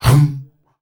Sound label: hum hum, S. African world voices; ILIO; Vocal Planet